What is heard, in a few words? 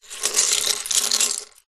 leisure lego pieces movement on wood table